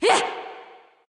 grunt jump ghost; smite; voicepack; hel

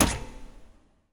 movement fire land; smite; Arachne

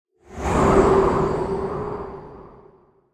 Death Wind; Smite; Susano

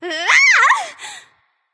yay; smite; artio

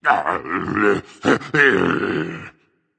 grumble berserk; cuchulainn

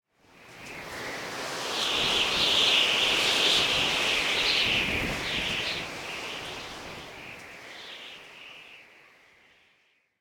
ambience whistle wind; smite

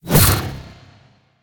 fire; Smite; Vamana

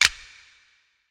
claw snap; smite; khepri

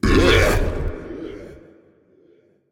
"ugh"; smite; sylvanus

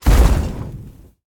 Bodyfall; smite; horus

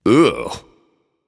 nv ugh; smite; achilles

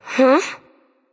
confused; smite; skadi

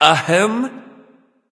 clear throat; smite

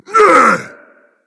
grunt attack berserk; cuchulainn

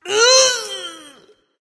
grunt lift; smite; ahmuzencab

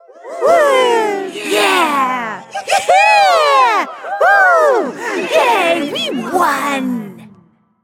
yay; smite; izanami

